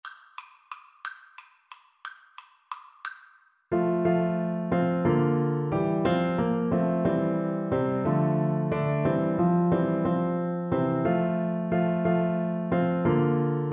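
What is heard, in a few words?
recorder karaoke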